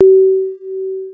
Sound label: Ringing, Retro Games Sound FX